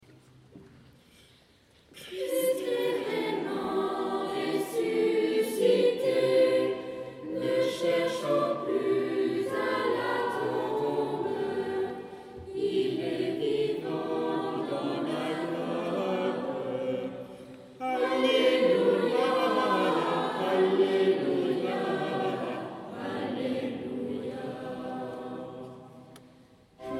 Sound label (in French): Christ est vraiment ressuscite, petits chanteurs